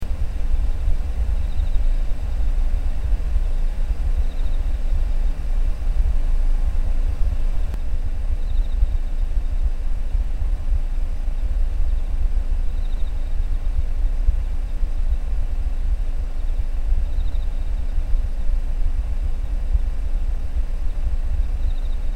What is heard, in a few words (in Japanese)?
車内から聴く外の虫の鳴き声